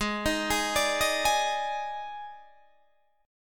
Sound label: Eb Major 7th Suspended 4th